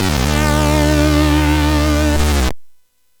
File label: Jingles; hvsc